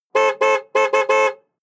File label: sports car ext horn rhythms